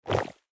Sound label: accumulate